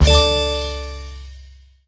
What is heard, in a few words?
count up end; Fire Queen